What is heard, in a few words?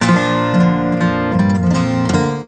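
12-string guitar toot; Musical Instruments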